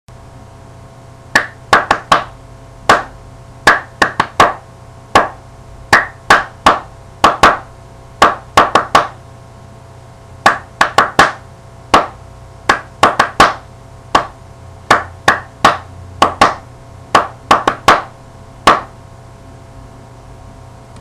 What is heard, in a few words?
perc